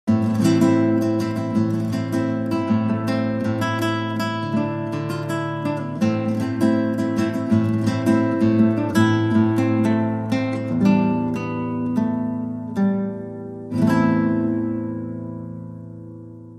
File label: Solo Guitar